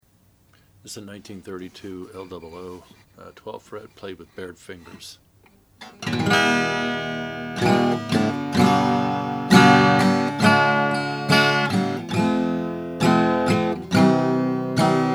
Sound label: gibson l 0 black